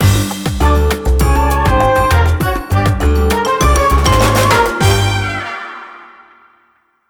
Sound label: STAB; Buzz! Junior Monster Rumble; PS2